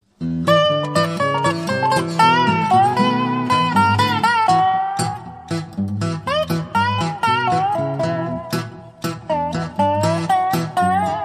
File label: Delta Blues w Dobro